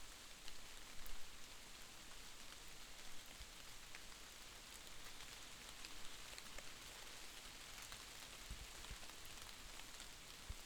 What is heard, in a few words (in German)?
Regen LOOP